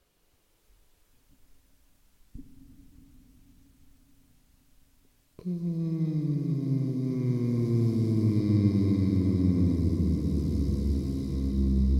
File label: hum